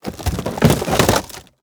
plastic break

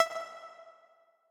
Tessellation Move F; Mixolumia

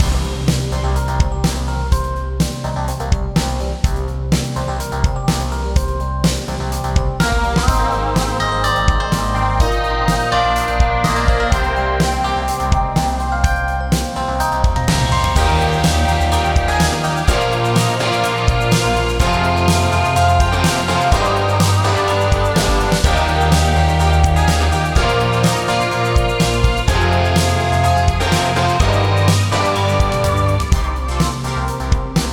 80s Boss; Chillheim

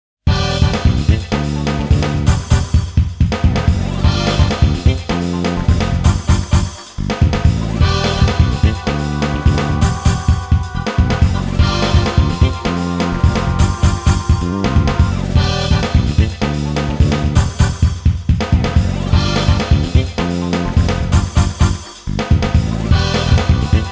Goal Replay BGM

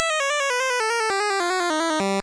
Player Death

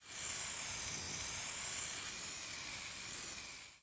GAS START

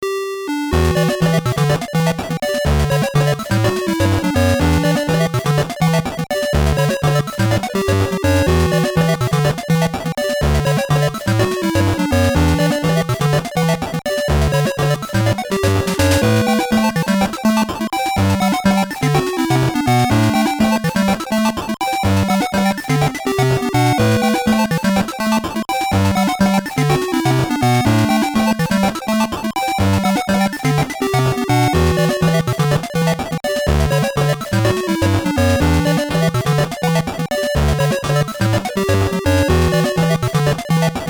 BGM, WonderSwan Color; Xi Little

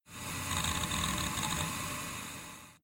hdd; progressbar95 progressbar 95 gamerip android

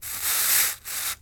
Gumbo Fire; Diner

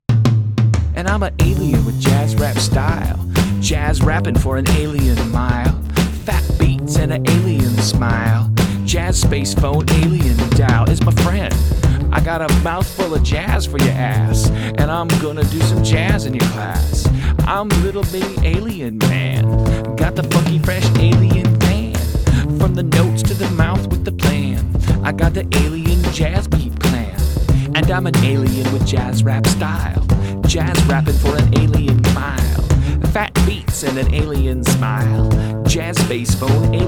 Music Alien Jazz Rap; Rick and Morty: Virtual Rick-ality

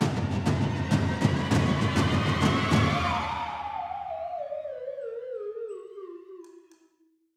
Music Sting Rocket Fail; lego dc super villains 2018 nintendo switch gamerip; MUSIC LVL07A STING ROCKETFAIL

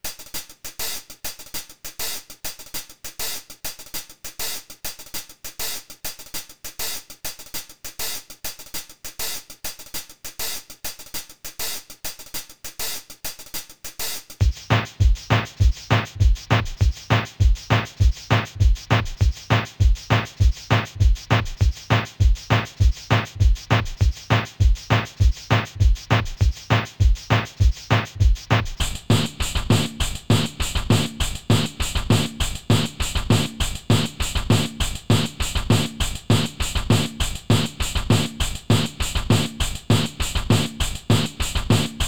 Main Drums; Seline

